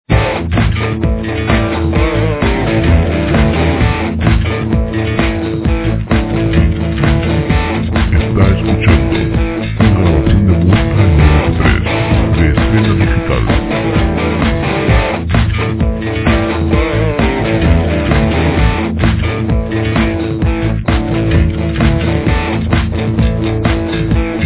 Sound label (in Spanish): motos rock